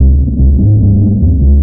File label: bass blown to smithereens, Loops, Phonky